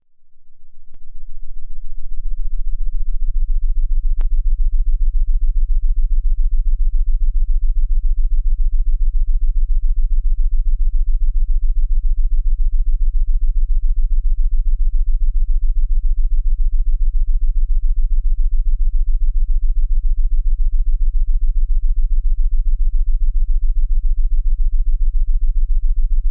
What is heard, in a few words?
Submarine